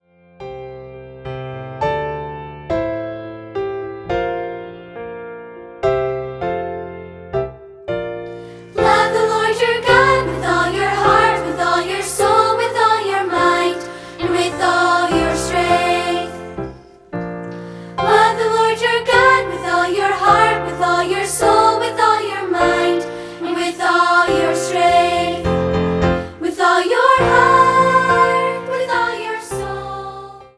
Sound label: Love the Lord piano